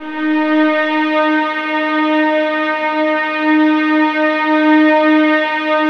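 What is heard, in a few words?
violins F4, Synclavier Strings; ILIO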